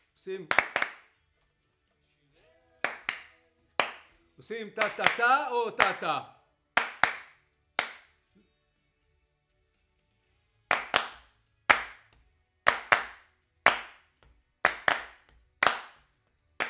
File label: Claps